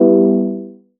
Synth Prism Emin

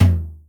Tom; Ableton Live 12 Lite; Core Library; Drums

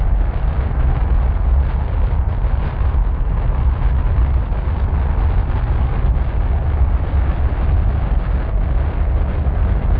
launch; To the Moon

